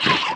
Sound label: wriggler death; enemies; Factorio